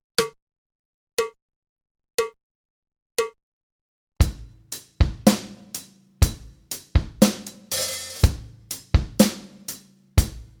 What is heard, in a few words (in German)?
Uebung, schlagzeug